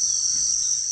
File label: jungle stuff